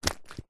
footstep single shoe female boot leather buckle wet mud